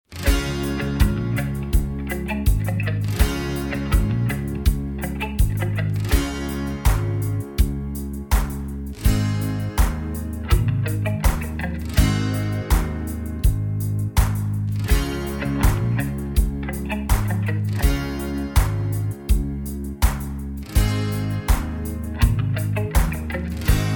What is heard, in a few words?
(Db To Gb) No Backing Vocals